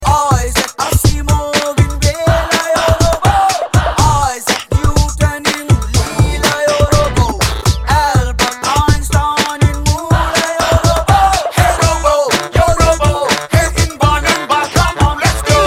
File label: Boom Boom Msg Tone